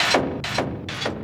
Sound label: Kaos FX, Ambient Grooves